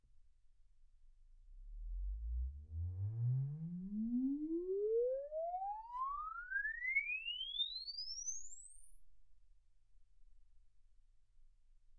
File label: exp swept sinus 10Hz 24kHz